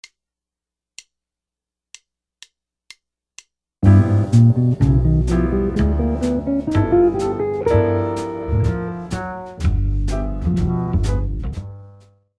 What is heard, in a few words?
altered scales, guitar